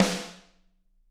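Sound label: snare drum; Bob Clearmountain Drums I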